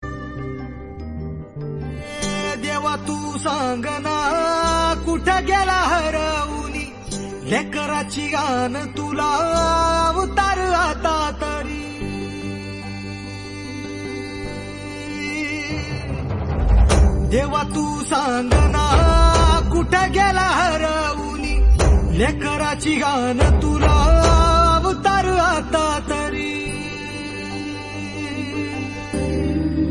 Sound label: Marathi Bhakti Song Ringtone